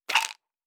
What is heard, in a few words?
Pills; Survival Game Sounds - Lite Edition; Objects and Items